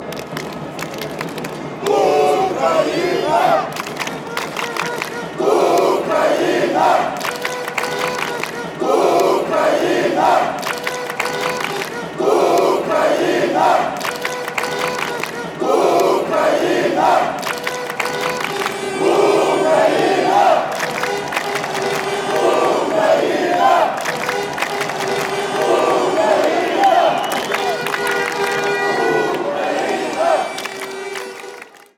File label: ukraine chant, fanchants